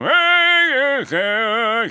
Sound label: Sprint Loop